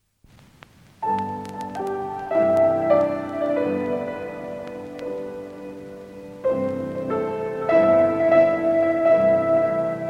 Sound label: piano essence